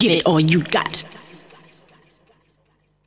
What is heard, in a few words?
vocals; SoundFactory; SMPMANIA